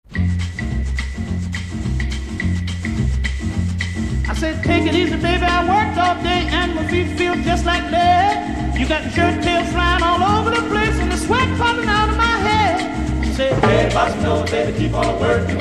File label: Bossa Nova